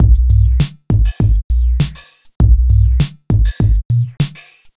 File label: Beat, Drums, Eclectic Electronica